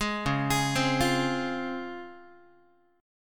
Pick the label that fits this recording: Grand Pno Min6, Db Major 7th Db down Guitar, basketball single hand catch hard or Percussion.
Db Major 7th Db down Guitar